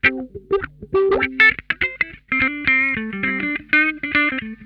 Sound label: HIDE N SEEK, Funk Guitar; 90 s Sample CDs; Zero G